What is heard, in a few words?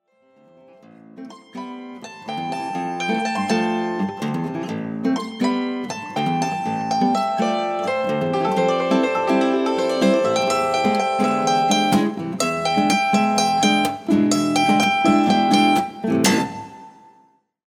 Funky